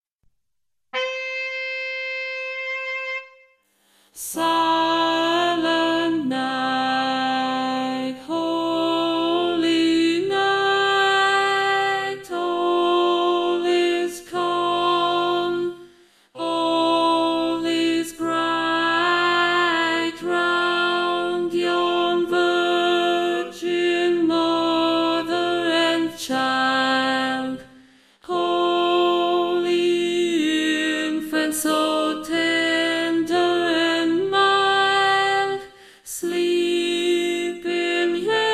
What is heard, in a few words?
silent night tenor, tenor